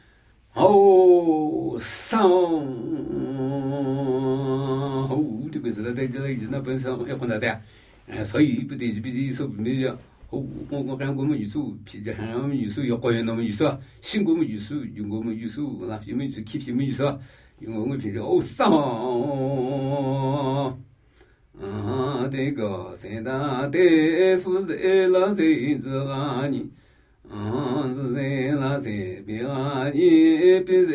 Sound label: Namuyi Tibetan Ritual Chanting; Phi tca pi su